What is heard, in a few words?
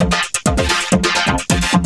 Vocobeat; Sonic Foundry (Sony Creative Software)